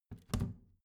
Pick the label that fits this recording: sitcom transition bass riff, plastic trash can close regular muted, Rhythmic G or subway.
plastic trash can close regular muted